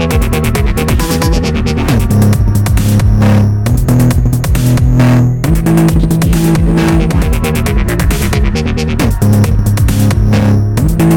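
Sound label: boss loop; svencoop; source of life; deimaus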